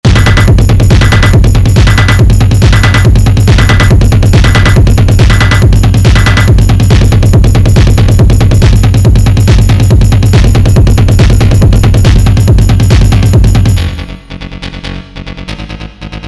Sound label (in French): MUSIQUE TROP COOL